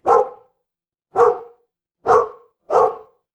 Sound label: animal dog bark